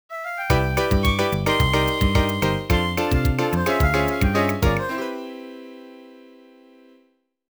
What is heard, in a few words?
Mall (Jingle); thats so raven psychic on the scene